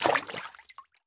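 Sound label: bullet case bounce 50bmg water; gmod; viper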